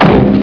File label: Bong; Three Stooges